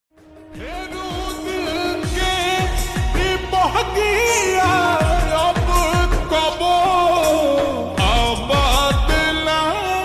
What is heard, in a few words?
Slowed Reverb Ringtone